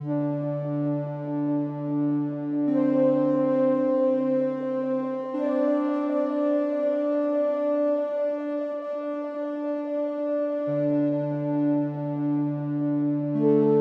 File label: Dm90 PAD